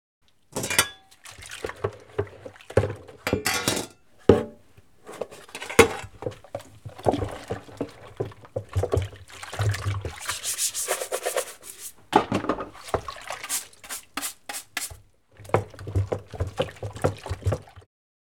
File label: Dish washing kitchen water dishes clanking